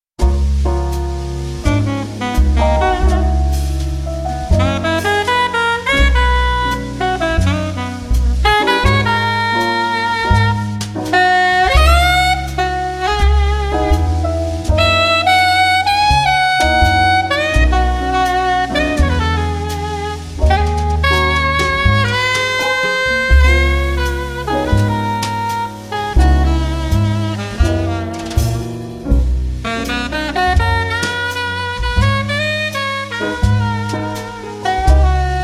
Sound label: Late Night Jazz